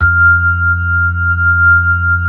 B-3, Hollow; Keyboards of The 60's and 70's

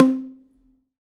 Prepared Drums

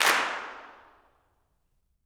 CLAPS, Gospel; ILIO; Vocal Planet

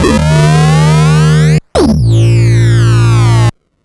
Sh09 Bass Loop, 120BPM

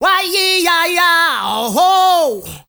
MALE SOLO, Heart of Africa

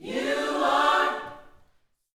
Vocal, Gospel, PHRASE; Spectrasonics

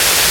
Stream Cicada; Kawa no Nushi Tsuri 2

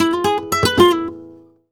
Flamenco Guitar Remates De Pulgar, e; Best Service ProSamples vol.46

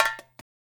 FILL L, Percussion; InVision Interactive; Percussion Slam

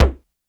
Bass Drum Tom Destroyed; Lost Tapes From Mars

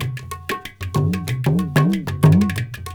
INDIA L; Spectrasonics - Heart of Asia CD2

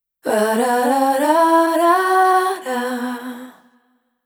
Multi-Voice Loops; Piriform; Sonic Foundry (Sony Creative Software) - Pocket Diva World Class Vocal Samples

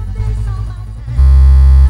lop 126bpm, House Instr and Stab Loops

